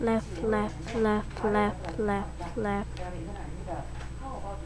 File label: laugh